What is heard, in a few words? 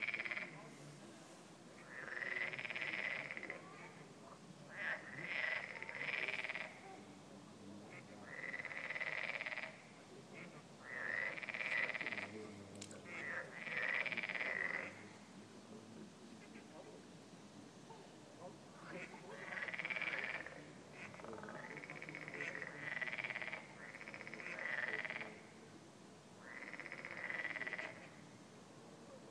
frogs and ducks in pond